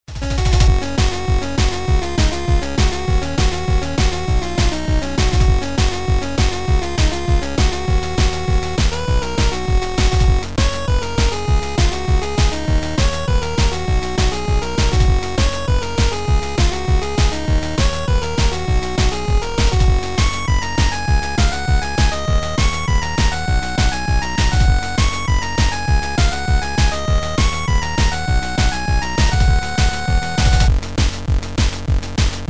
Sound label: 8BIT; Code Lyok Ouille